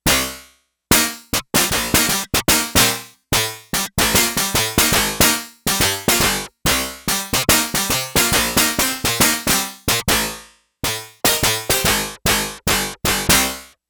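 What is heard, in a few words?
Splash Clavinet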